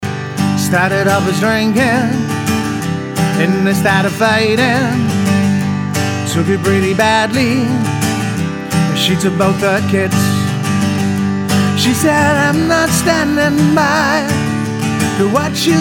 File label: Solo Uptempo Older Songs